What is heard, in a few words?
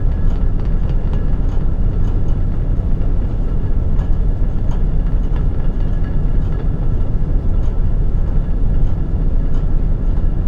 Drone Bass Front Belt Rattle, Cement Factory; Double Trouble Audio